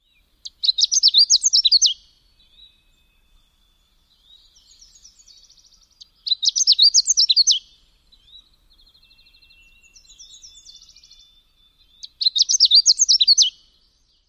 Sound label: songs; NY